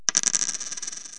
muenze

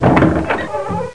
Tuer auf; tivola; max1demo

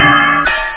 klirr

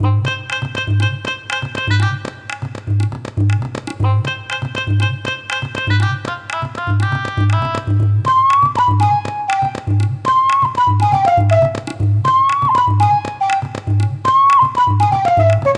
MUSIK; MOBICLIC 89

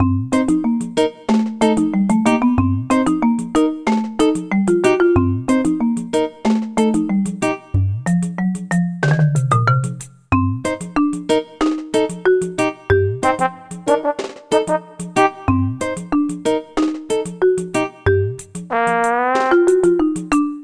MUSIK neutre

